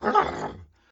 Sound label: horse indifferent